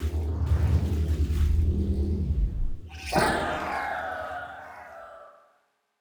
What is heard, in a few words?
brake jump